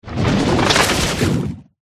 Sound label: ent